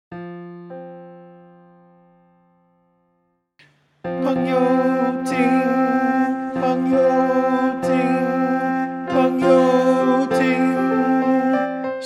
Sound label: Tenor